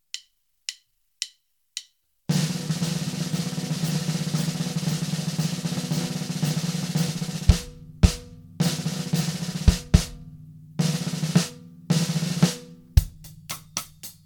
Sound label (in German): schlagzeugsolo fur anfanger